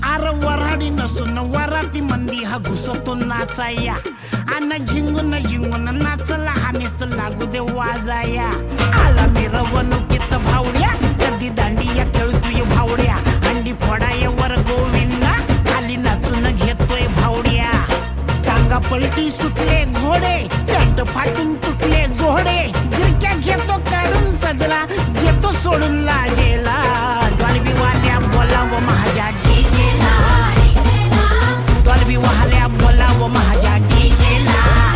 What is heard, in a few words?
Dolby Movie Ringtone